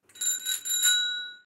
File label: horn